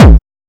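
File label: HSE-BD, house bass drum; X-Static Goldmine 1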